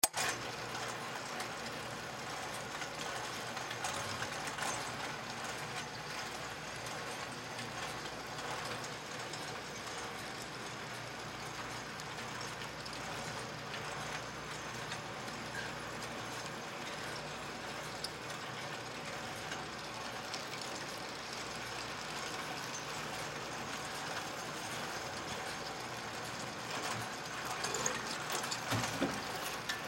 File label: Laundry Mat Washer Run Short; Laundry (Sound Effects:Household); Blastwave